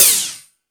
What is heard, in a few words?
Lazer Drums, E-MU Pro-Cussion; drum machine